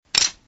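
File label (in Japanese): hashi, chopsticks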